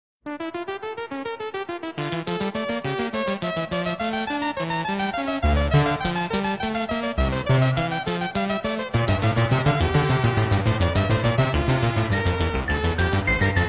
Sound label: Two-Part Invention in D minor